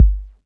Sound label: kick g key, kick drum sound tuned to the G note. Loudest frequency: 75Hz; kick drum samples